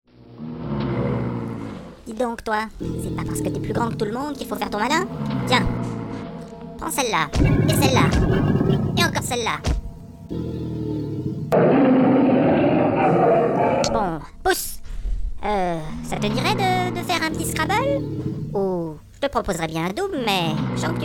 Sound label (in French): mort chevalier enfer; doom; mort de marines par certaines créatures